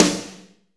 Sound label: SD, KIT Real-Kit; Sound & Vision - Gigapack I CD 1